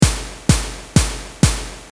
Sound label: kick snare